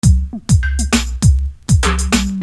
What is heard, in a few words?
Beat, Hip-Hop Street Beats